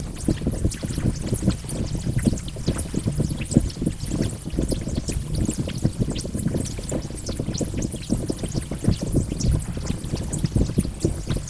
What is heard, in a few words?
fire